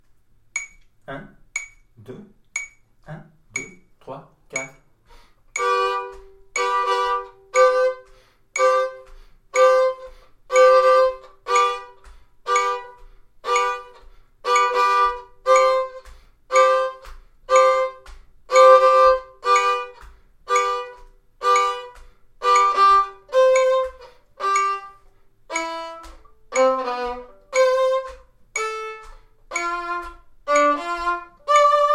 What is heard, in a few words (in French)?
rythme violon; moses